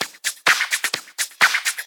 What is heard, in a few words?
FX Loop 128 BPM, House